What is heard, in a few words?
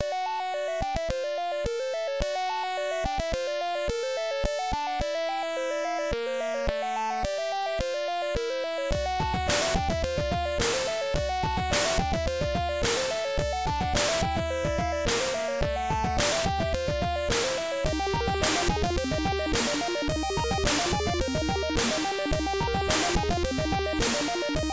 melodic power track c64 style